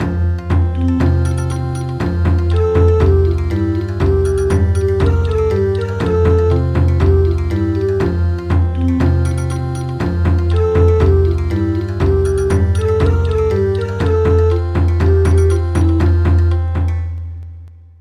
Bon Odori; Morning tide

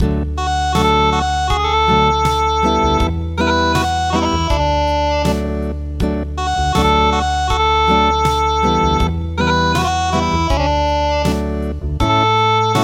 Acoustic; Amawole

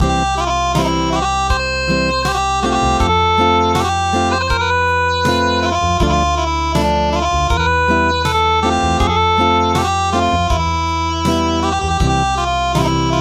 Acoustic

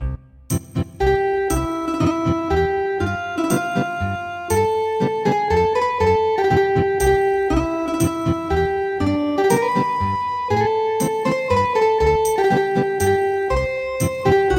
Ethnic Guitar; traditional

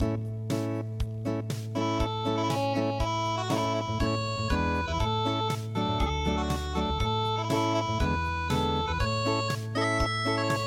the rising sun unpluged

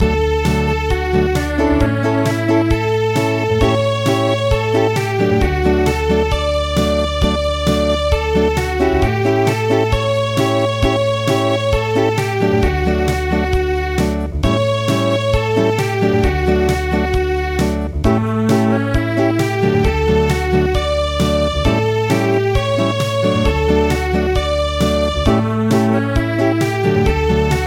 Acoustic; Hinten bei der Stadltur

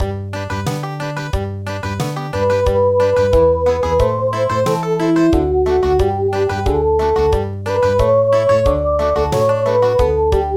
Country

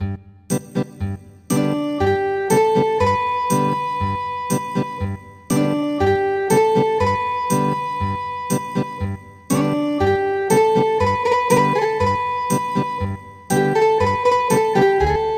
Ethnic Guitar

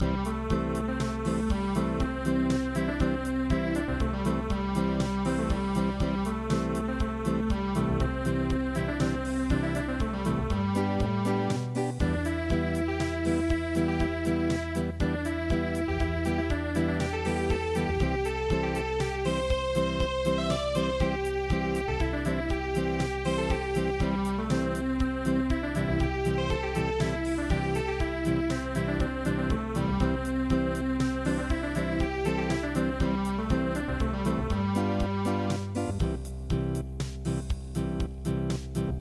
geum gang san ta ryeong Unplugged

